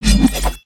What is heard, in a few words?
Samurai Spin; Valiant; aronwk collection; ndaudio